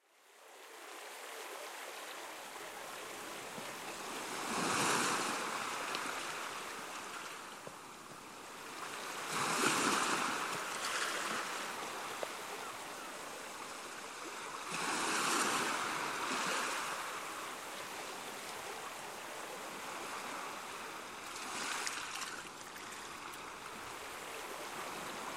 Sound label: relaxing ocean waves